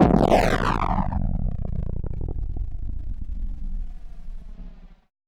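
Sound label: CRAZED DRUM, Impacts, Hits & Booms; Distored Reality 1&2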